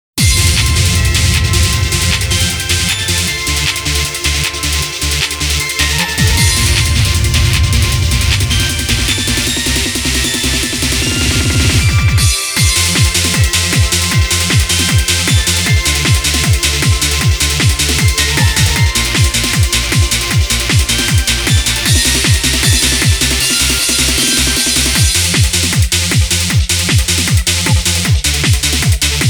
instrumental

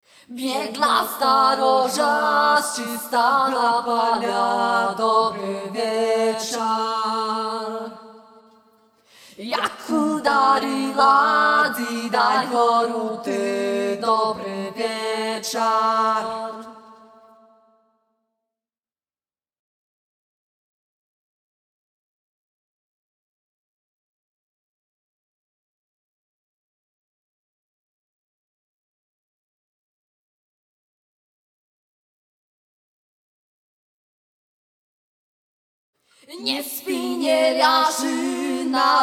Combat Loop Layer; The Witcher 3 Wild Hunt

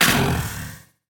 pain

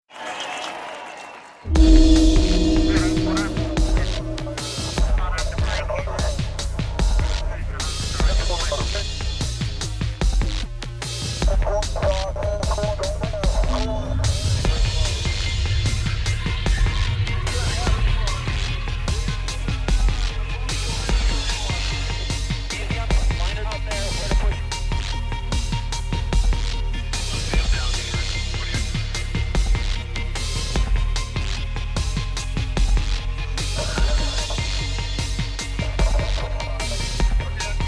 Post Race Music; Need for Speed Shift

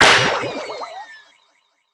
bomb hit

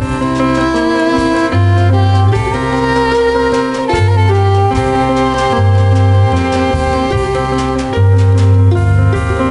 Loop

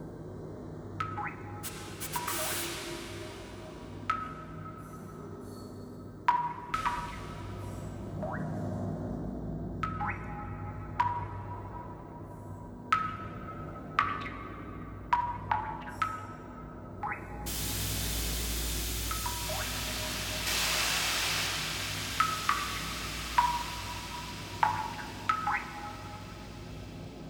Sewer Ambience; Death Or Glory

